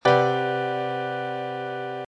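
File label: Cmaj7#11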